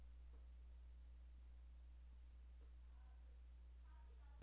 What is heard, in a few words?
fry